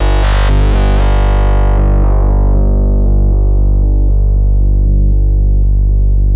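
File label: sample and hold G1; Best Service Dream Experience; S+HOLD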